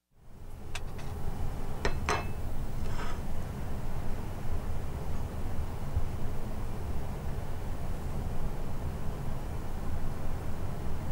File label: interlude instrumental